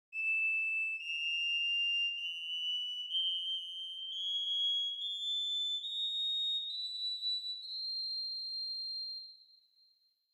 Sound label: bit aliasing